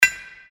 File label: hammering